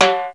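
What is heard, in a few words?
Roland 626 high timbale; Drums; Tr-626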